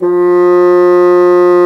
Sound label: F3, Bassoon Swel; Roland LCDP04 Orchestral Winds; 90 s Sample CDs